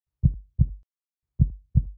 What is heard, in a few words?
heartbeat